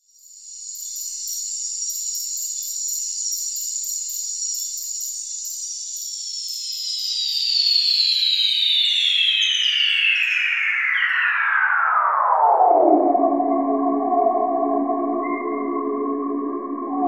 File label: Ultrasonic Lullaby, Panama Rainforest, Slowed Down